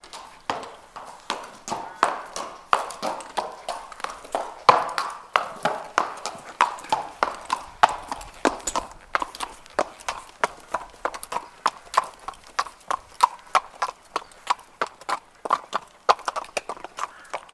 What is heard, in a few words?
Horse FS On Hard Surfa